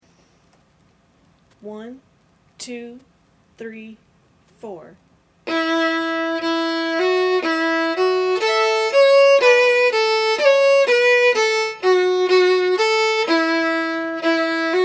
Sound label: fiddle slow; Miss Wedderburn